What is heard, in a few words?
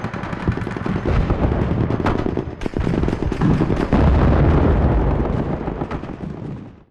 scripted distant fog battle; Titanfall 2